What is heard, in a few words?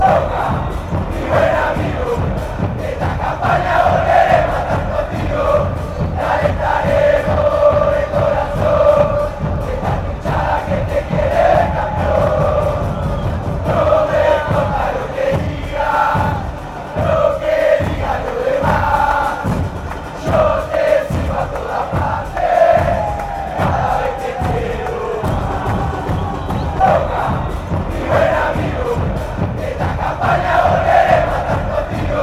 we cheer you, fan chants